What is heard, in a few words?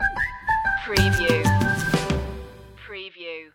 whistle beat stinger